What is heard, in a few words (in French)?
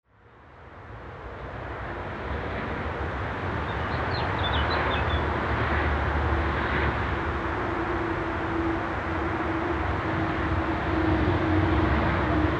Aix arcades RN296